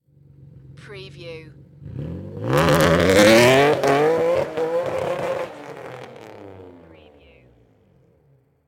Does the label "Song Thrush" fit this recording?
No